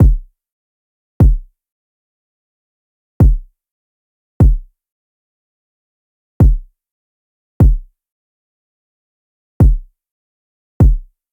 loop kick 150; kleopatra

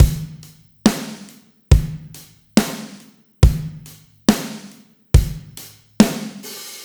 SloMo Beats, Loops 140 BPM

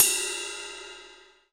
CYM RIDE, Dance Kit, Roland

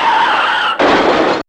Car Smash, Fairlight CMI IIe

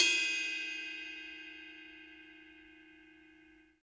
CYM RIDE, Kit; Real-Kit

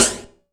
Yamaha CS1x Drums

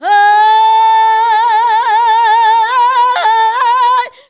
SUNG ROMANI VOX; Best Service; World Colours

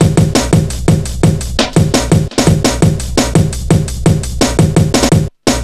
rottweiler, Drum Bass Drumloops 170bpm; Zero-G; Total Drum Bass

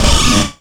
SFX, Drum & Bass, 160 BPM; Ueberschall; Drum & Bass Flash Trackz

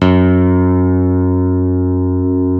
CP-80, Yamaha CP-80; Greytsounds VOL-1

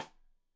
Side Stick Stereo; Deeply Sampled Drums; SMDrum

